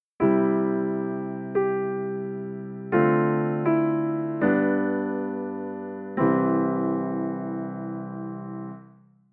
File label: Progression with ii